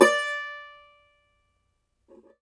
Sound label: cumbus saz D, plucked strings